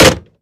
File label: hang up; yuri